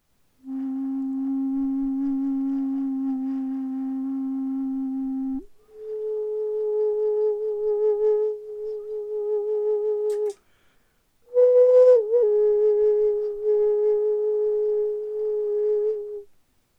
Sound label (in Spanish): Ocarina biglobular